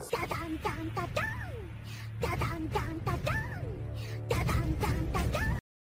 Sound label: dun dun dun dun da